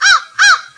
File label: Crow Hi; Sonic Foundry; Ambient Realms